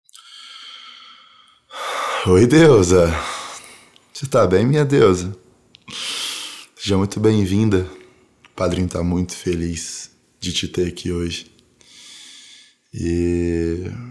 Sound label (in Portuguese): Cachoeira 8D